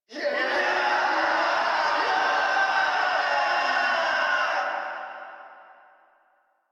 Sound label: Crowd; Warframe; Rathuum; Stage Three; en